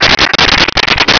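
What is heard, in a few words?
voice droid; pdroid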